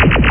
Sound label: LAZER; Flashback Demo